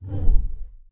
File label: Whoosh Woow